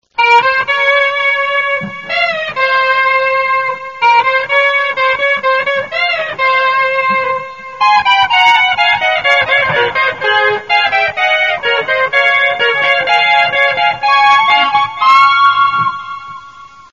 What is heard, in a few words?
solo